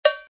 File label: cowbell